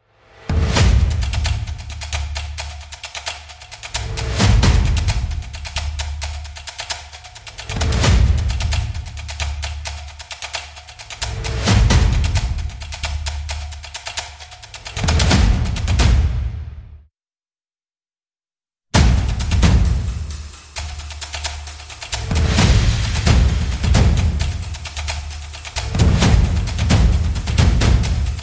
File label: Percussion Only